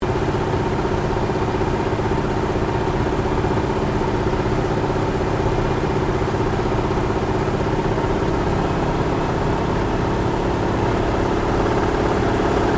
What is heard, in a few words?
AIRCRAFT CAC WIRRAWAY FLYING INTERIOR STEREO; CA-16 Wirraway; Airplanes